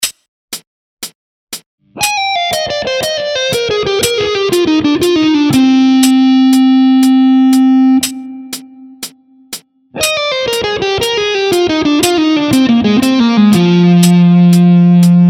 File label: Speed Development Lick slow, guitar